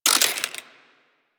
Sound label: Laser Aim Rifle Semi Switch, Weapons; Grineer